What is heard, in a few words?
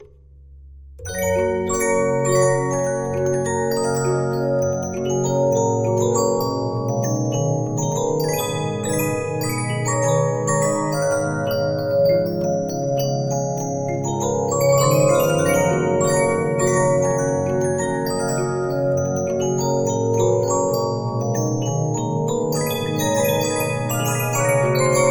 Regina, 15inch disc musicbox